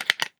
PET bottle open

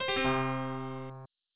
Shutdown

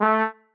marimba trombone; ゆめ2っき

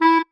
marimba penguin; ゆめ2っき

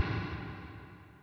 echo

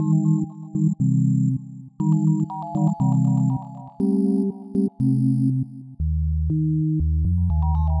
bgm melo, Music; ゆめ2っき

